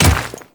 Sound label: Royal Guard Dirt Step, footsteps; Enemies; Warframe; Grineer Royal Guard